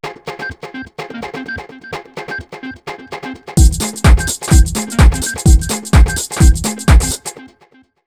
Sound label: 127 ALL, Fresh Disco House; USB Soundscan vol.20; AKAI